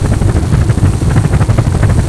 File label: engine; falcon